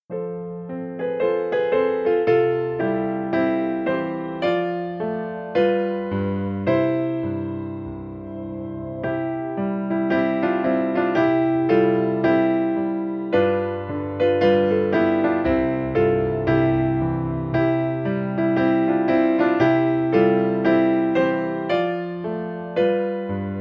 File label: Piano